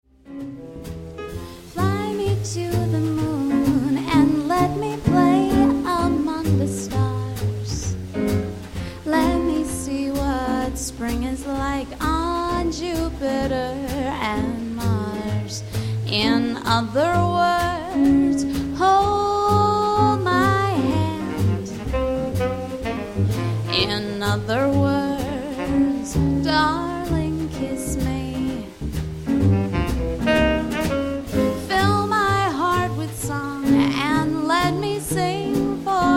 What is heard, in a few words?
Vocal, Ballroom Dance Music Band